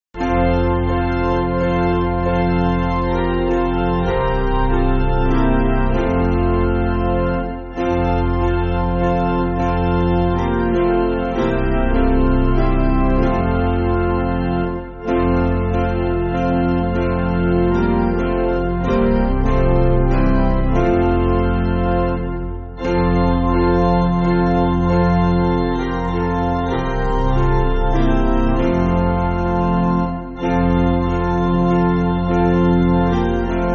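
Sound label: Piano Round, Music Backing Files for Churches; Basic Piano & Organ (CM)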